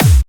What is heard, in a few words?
Clubby Kicks, Bassdrums